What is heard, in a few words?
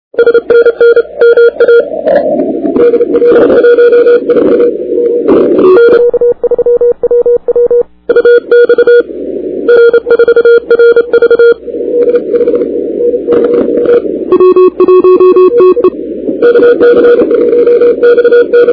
cw